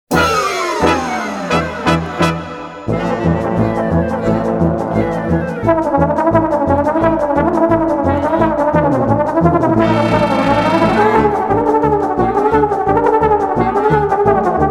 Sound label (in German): Hummelflug